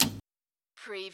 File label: Servo Panel Sound - Switch TV